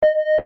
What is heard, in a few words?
beep